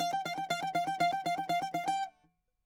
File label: bouzouki